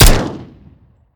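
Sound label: mike fire player, weapons; gmod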